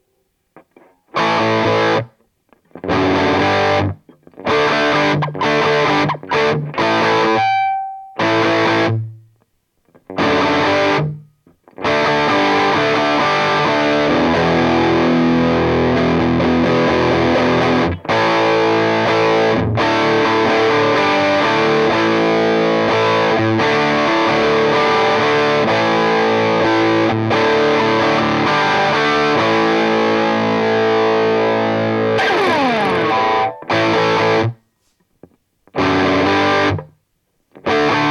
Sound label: disto